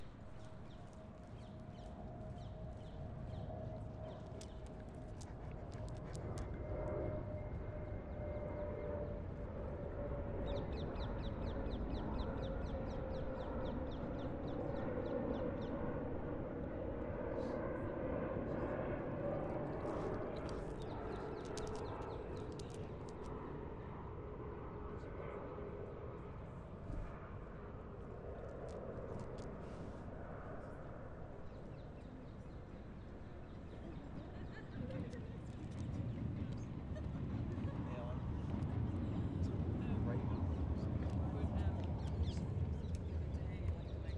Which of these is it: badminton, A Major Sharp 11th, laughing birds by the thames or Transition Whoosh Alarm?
laughing birds by the thames